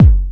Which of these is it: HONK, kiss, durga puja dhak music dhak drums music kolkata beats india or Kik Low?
Kik Low